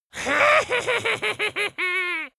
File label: dwarf laugh evil